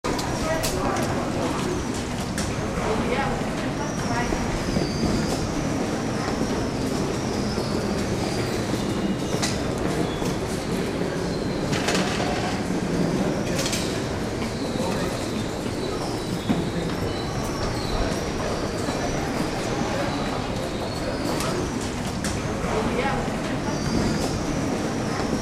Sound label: subway station atmo